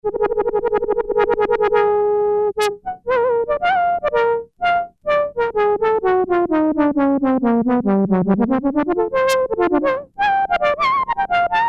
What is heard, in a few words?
Digi-Brass, Korg Poly-800